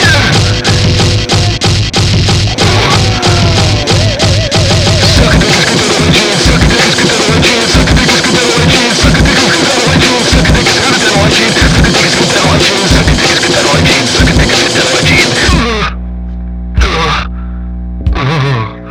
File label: haha